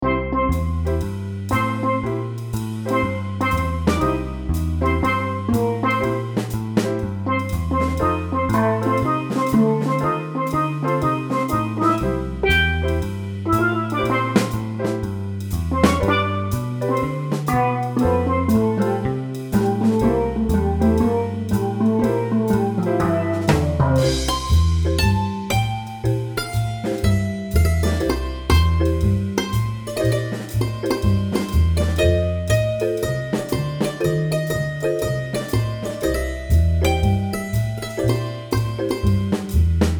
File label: MIDI blues